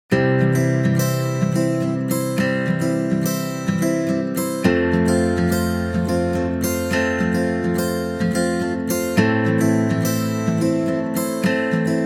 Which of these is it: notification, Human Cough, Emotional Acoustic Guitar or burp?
Emotional Acoustic Guitar